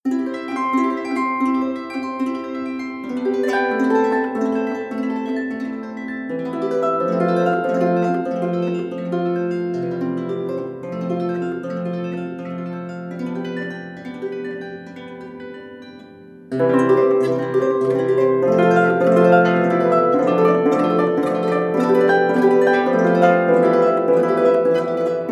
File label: natural harp arpeggio; loops; titan